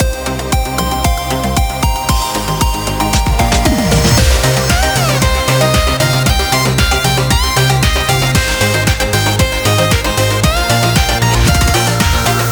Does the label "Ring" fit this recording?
No